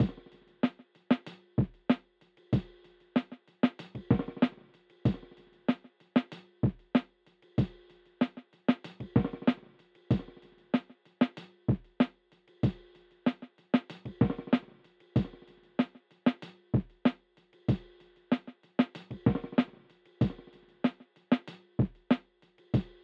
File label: 95 BPM G Min Drums; Loop Stems; Cymatics; Modern Classics